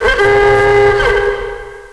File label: big alarm